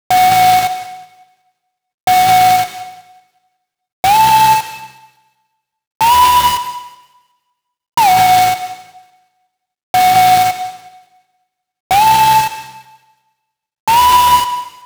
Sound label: 122 Bm Lead Synths, Complex Electro Synths; Prime Loops; Amplify